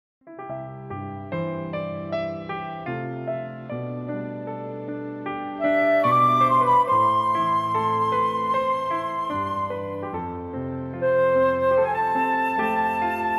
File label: C play flute reference